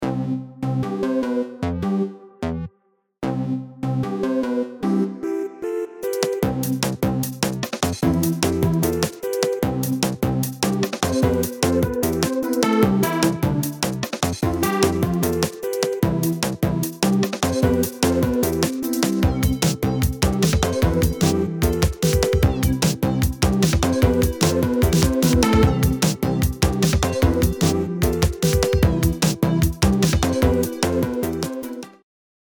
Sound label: Ringtone, Samsung